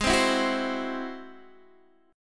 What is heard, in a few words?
Ab Augmented 7th Ab Guitar DADGAD; strummed; x,11,10,9,9,10; Ab+7; JGuitar